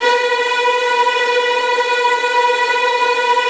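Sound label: STR TREMOLO, Virtuoso Strings, B2, left; ILIO; Kirk Hunter Virtuoso Strings VOL-3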